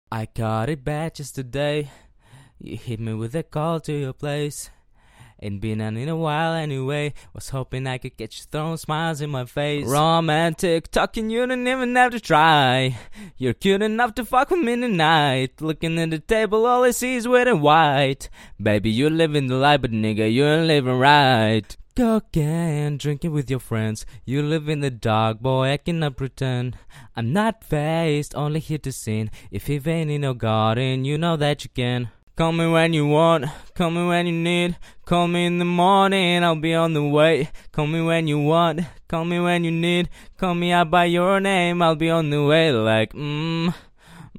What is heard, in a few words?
hh 90 Am, acapella